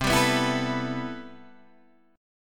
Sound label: C Major 13th C, Guitar DADGAD